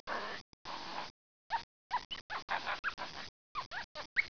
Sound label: monkey; 12000 Graphics Pack - A Complete Graphics Collection For Your PC